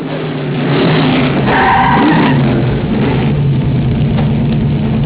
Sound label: car